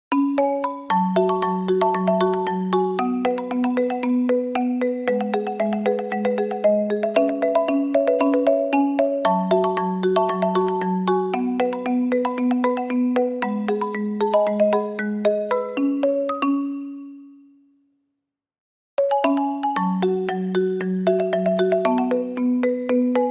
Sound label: ringtone